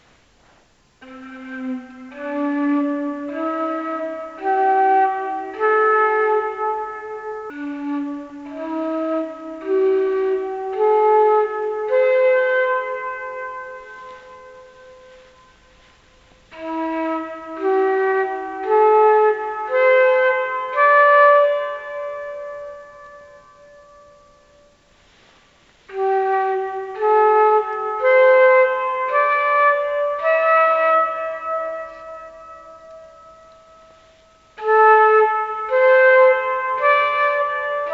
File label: Pantatonic tonleiter; Das Lied von der Erde; Proben